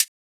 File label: HiHat, Psytrance; Drum Shots